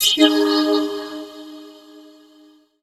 VOICE FX, Dance Vocals

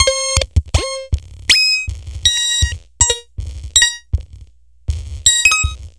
Melody, IDM

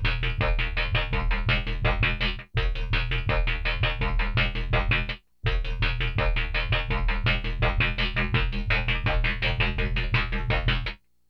SLOW WHA 83; AMG - Global Trance Mission VOL-2; PROCESED LPS

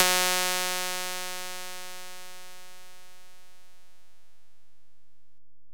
HiHat, Alesis DM-PRO

